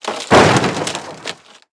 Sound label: metal fence; World of Tanks Blitz